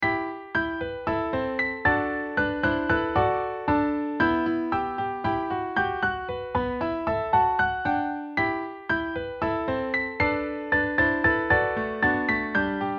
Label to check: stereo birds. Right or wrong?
Wrong